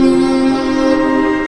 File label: oohwhuuaa; Truth-Hz - 1-Hit KO Sample Pack